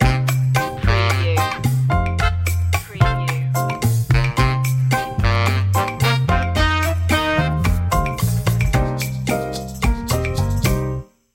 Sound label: havana lounge stinger